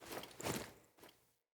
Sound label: Foley Action Default Blight Arm Parry Riposte; Player